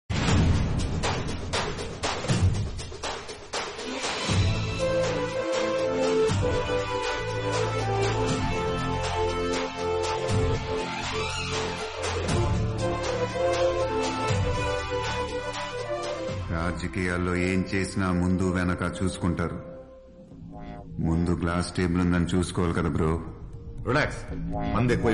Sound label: helicopter bgm; Bharat ane nenu